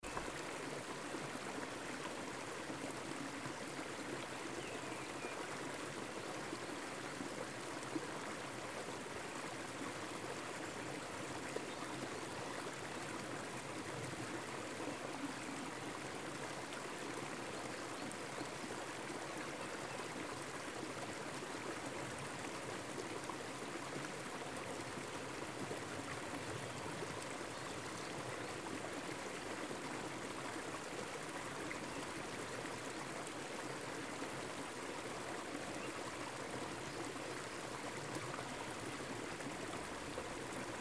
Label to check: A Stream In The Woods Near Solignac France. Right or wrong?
Right